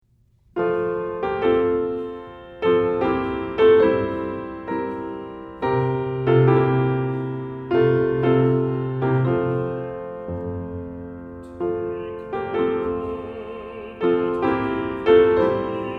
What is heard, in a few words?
Piano; Take The World But Give Me Jesus